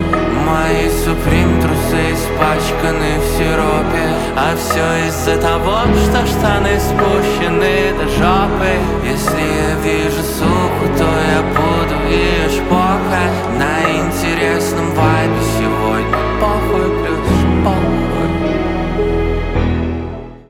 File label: piano version; пианино